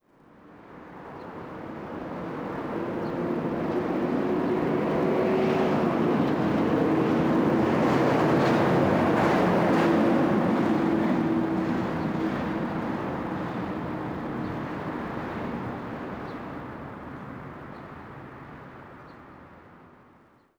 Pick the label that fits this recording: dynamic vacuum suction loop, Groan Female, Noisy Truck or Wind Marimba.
Noisy Truck